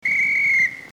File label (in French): sifflet